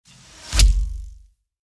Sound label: arrow